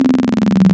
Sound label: VV CMOS BP Hi Res PWM, wavetables; Animoog